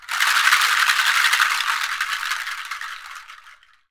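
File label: rattle, percussion; ILIO; Synclavier Percussion 2; World & Orchestral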